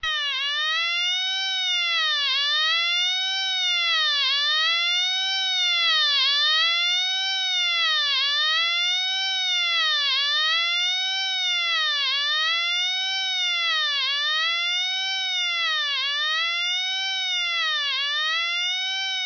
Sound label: Huiiiiiuuuuiiiiii